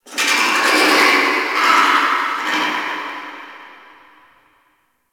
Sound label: Ice Critter Distant; Warframe; Corpus Ice Planet